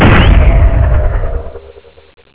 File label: explode, emigrenade